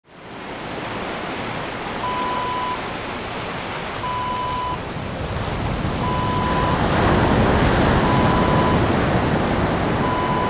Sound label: beach sea waves